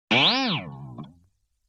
Boing Box Short Hit; Vintage Cartoon; Hits & Tones

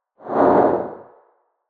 Windy, whooshes

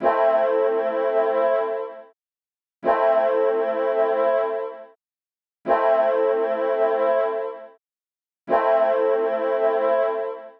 170 BPM A Min Synth Horns, melody loop stem; Cymatics; 2022 Melody Collection; Revenge

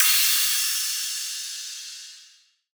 House Crash; Drums; One Shots; Cymbals; Crashes

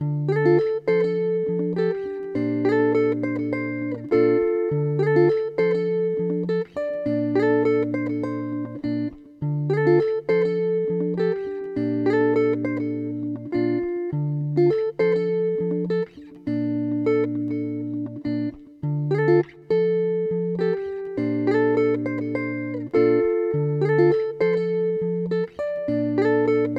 102 BPM D Min, Guitar; Somebody; Cymatics; Infinity Guitar Collection

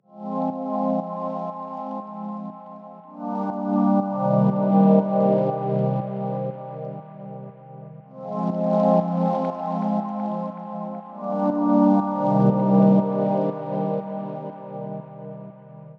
Pad Drone Loop Cm 120, Dub Techno; Loops; Ghosthack; Ghosthack - AC2023 - Day 17 - Dub Techno & Deep House Chords

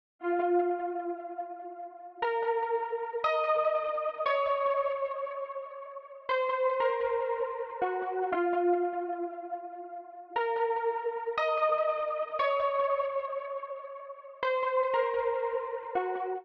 118 BPM A# Min Pluck, Hip Hop; Melody Loops; Loop Stems; Cymatics; Nueva

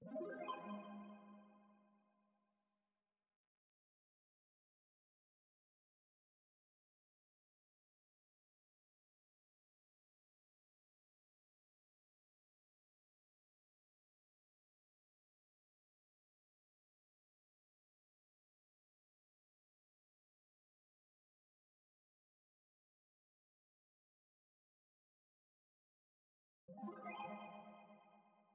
144 BPM F Min Arp; Melody Loops; Hip Hop; Cymatics; Gracias

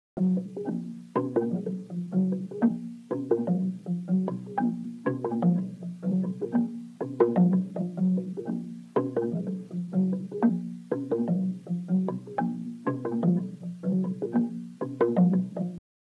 123 BPM A# Min Low Mallets, loop stems; Cymatics; Completely Falling Apart

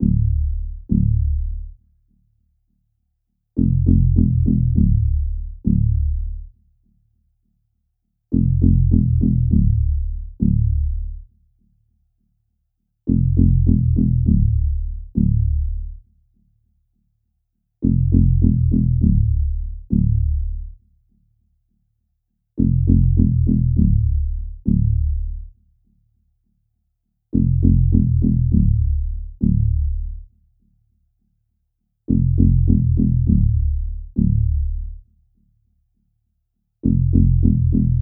101 BPM D Min Bass; Cymatics; Blue Faces

